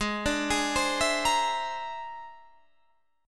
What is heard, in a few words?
A sharp 9th Flat 5th G sharp, Guitar